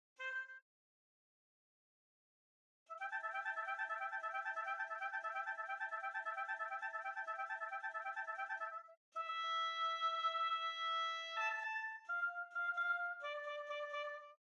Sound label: Oboe; Holst Jupiter